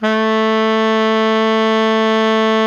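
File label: baritone mezzo forte A 2, Sax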